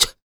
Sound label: FEM PERC, Vocal Jazz FX